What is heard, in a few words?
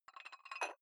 dumbbell put on rattling big weight soft, fitnessstudio